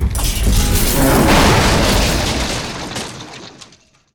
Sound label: can, factory